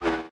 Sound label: mech armor moves; Factorio